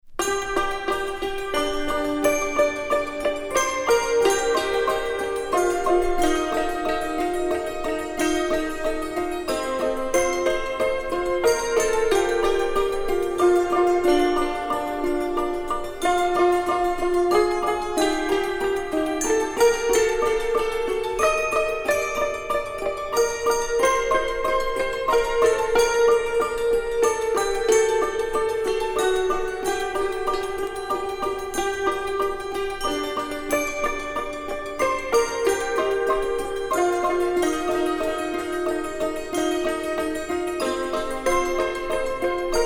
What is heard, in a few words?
The Barrel Organ